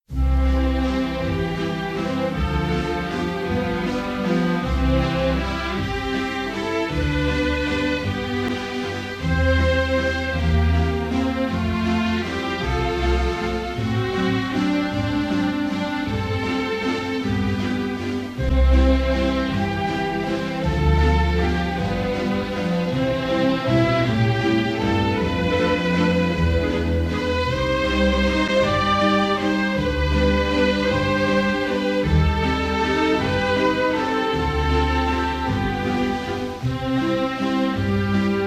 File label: Processional Waltz